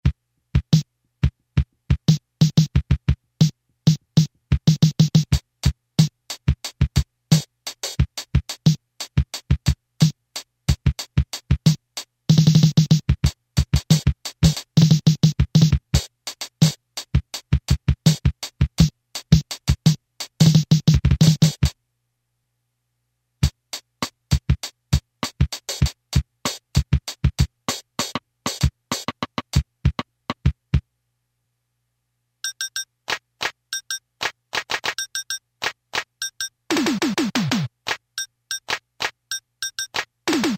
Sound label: drum solos, Casio MT-500